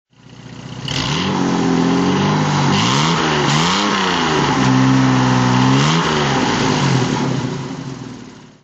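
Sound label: jaguar xk150 automatic throttle; Jaguar XK 150 3.4 Litre FHC, 1957; Engine sound; V3D/ ClassicarImages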